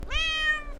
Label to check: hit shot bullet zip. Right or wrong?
Wrong